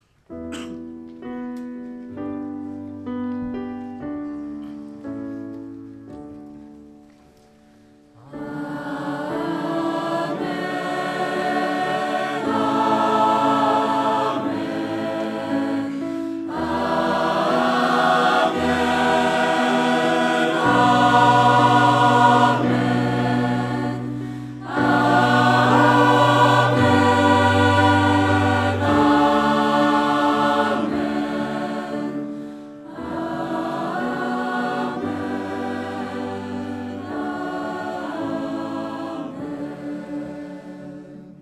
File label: Schola Amen